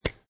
Pneumatic Drill Plugged In, Short air suction sound.